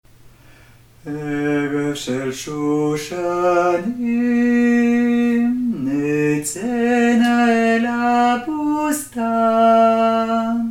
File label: Voix